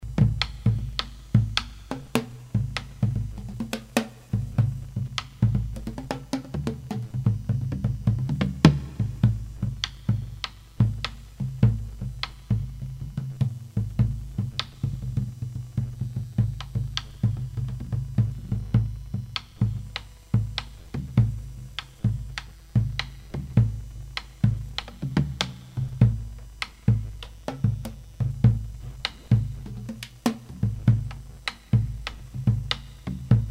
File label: bombo, ethnic instruments